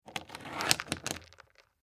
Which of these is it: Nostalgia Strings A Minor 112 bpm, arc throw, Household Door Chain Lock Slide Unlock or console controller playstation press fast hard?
Household Door Chain Lock Slide Unlock